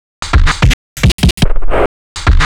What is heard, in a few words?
Loop - 110 bpm, Stutter Sounds; 99Sounds; Mangling Audio